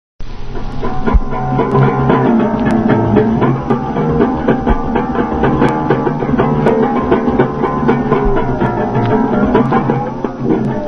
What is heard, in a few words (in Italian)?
gorgogliatore; russolo